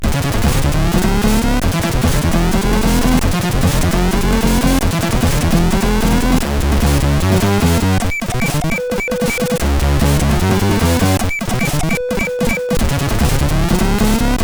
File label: Test, CSG8580; hvsc